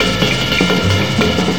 Jazz Jam, Drums & Percussion; Percussion Fills; FX; Sonic Foundry (Sony Creative Software); Futurist Drum & Bass

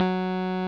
Roxichord, E.Piano

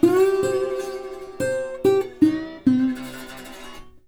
Blues Guitar Samples; ILIO - Fretworks

